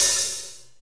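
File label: hi-hat, Techno Rock; Northstar; Drumscapes Roland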